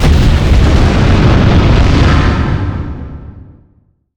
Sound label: Explo Large Air Implosion; Destruction